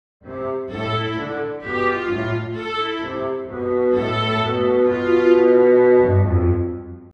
Strings Solo Violin Loop Theatre

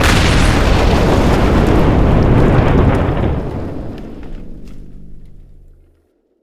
Huge Explosion

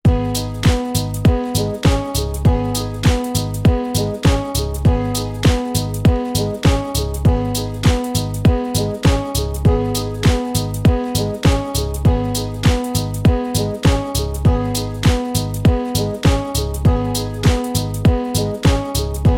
Joyful Music For Celebrations And Success

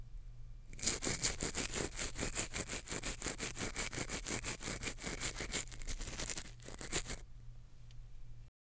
Teeth Brushing

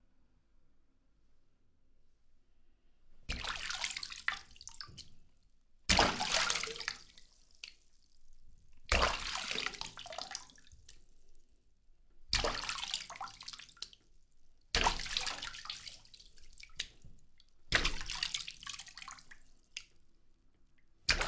Water Splash Bathroom

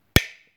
Light Switch On

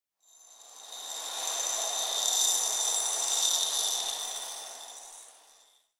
Salt Pouring Salt

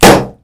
Balloon Pop

